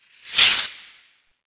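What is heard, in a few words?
skating; Microsoft Graphics Studio Greetings; Microsoft 1998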